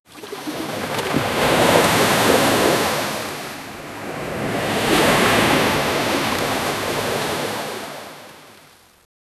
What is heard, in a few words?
ocean waves crashing on